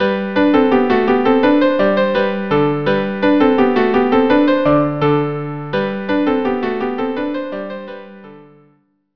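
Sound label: branles viola viola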